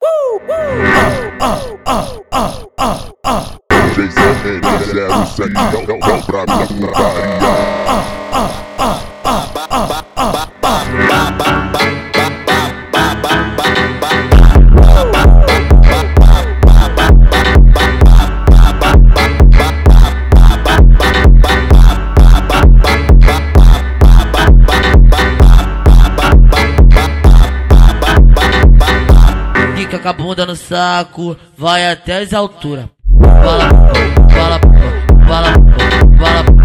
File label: phonk automotivo